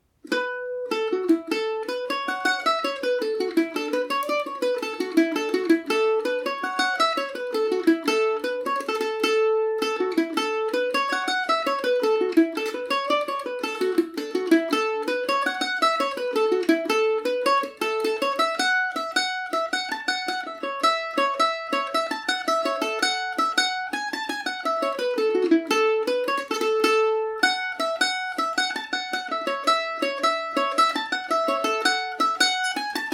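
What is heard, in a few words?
cowboy jig A major, irish mandolin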